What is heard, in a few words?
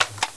reload, uzi; weapons; right